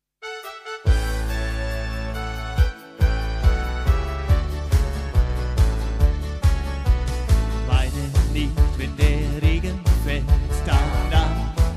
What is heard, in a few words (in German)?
Schlagerparty Medley